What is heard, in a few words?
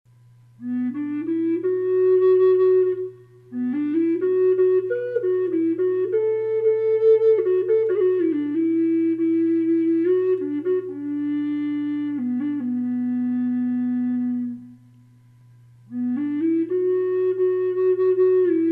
B, flutes; horn owl; collector flutes